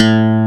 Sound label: TX 802; E-MU Producer Series Vol. 2 – More Studio Essentials; FM Polybass